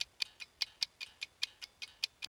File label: Clock Ticking; Ed Edd n Eddy; Adromulus Pack; EEnE